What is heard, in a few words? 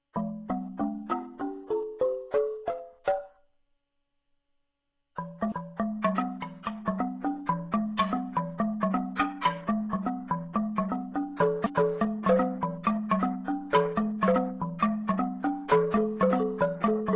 amadinda